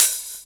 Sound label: DR. LOOP, DRUMS; X-Static Goldmine 3